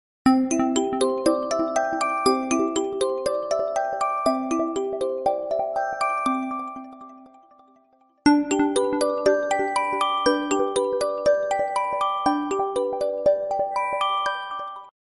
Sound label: echo tone ringtone